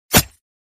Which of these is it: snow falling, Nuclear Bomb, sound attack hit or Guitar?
sound attack hit